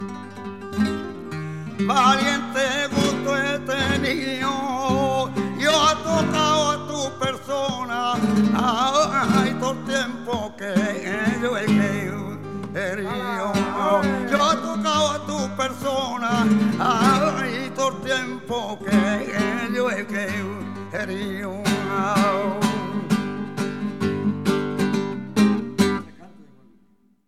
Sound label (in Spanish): Solea, flamenco; de Juaninquin